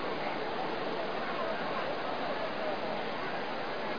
people

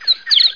bird; FishSim

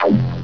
SWIPE

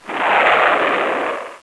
Missile Launch; PC Gamer IT CD 47; USAF; USAFDEMO

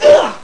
Karate; fightingspirit

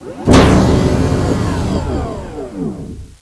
Door; PC Gamer IT CD 31; Defiance

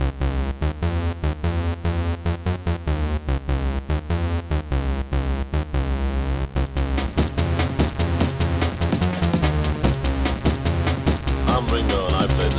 play drum; SoundMOD Volume 2

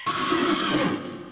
empty recycle; Level Pack 10

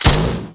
fire big; MOTS

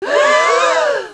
Crowd; Princess and the Pea; SoftKey

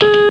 BUTTON; cdromtoday; startrek

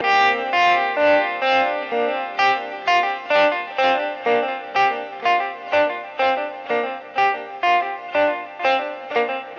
ELEC GUIT ETHNO 125, Guitar; eJay

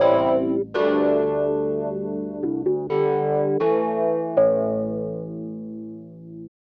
organ house 125 A; eJay; Keys

